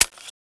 fiveseven clip out; ghost ws; weapons

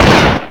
fire, weapons

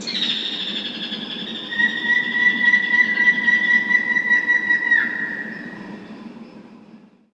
jungle call

